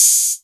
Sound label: 808 Hat O; Drums; Novation Drumstation